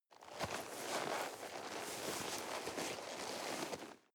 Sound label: nylon leather soft long